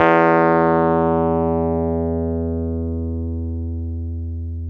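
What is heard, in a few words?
Wurlitzer electric piano E2; Keyboards of The 60's and 70's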